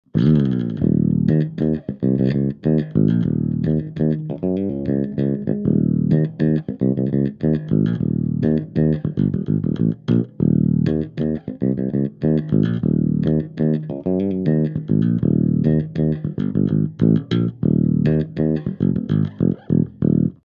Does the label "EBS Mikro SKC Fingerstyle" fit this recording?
Yes